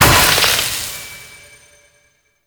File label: Shield Crack Large; Vehicles; Abilities; Thano Mech; Warframe; Nechro Mech